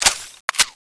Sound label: wing gun wing start; zombie rush